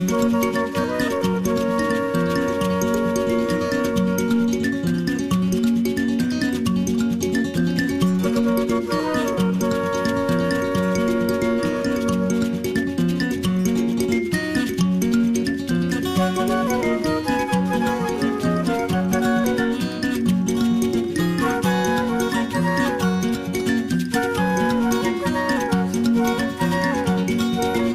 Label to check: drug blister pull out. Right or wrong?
Wrong